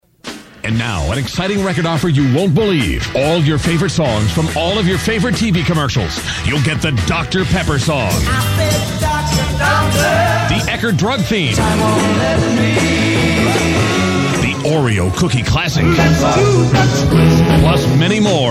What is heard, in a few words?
TV Commercials Music